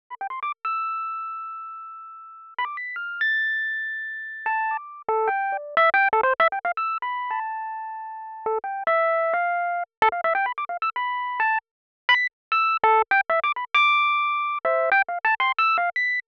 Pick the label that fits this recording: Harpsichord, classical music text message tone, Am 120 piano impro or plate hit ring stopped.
Am 120 piano impro